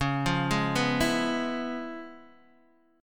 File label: C sharp 7th Sharp 9th Guitar Open D, chord